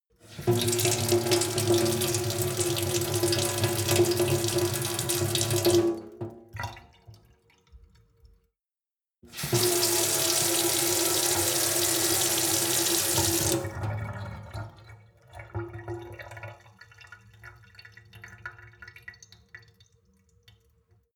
sink water flow medium seep; industrie-wirtschaft; gastronomie

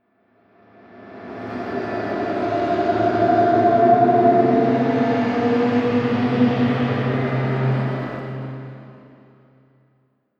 ghost choir transition, risers

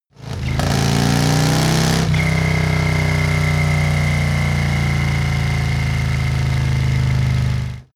motorcycle harley accelerate decelerate whistle

